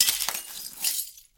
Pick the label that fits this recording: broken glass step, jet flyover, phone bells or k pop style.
broken glass step